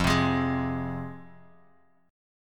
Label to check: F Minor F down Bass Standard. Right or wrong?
Right